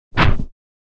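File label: Mob attack; Monster; 서문도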